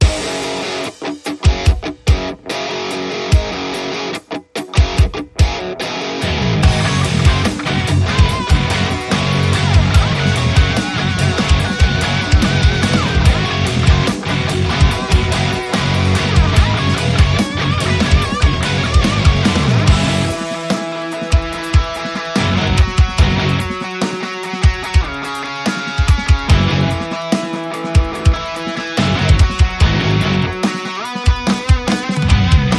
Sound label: Funk With My Rock